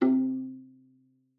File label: C3, VIOLA PIZZ; Xsample VOL-5; 90 s Sample CDs